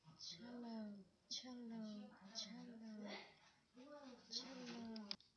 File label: cello